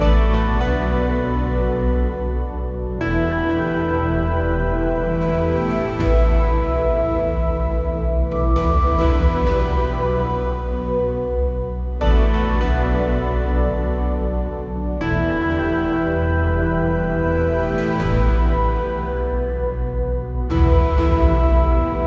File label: slow military tribute loo, tribute